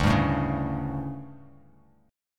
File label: D Major 7th Suspended 4th, D, down, Bass Standard; strummed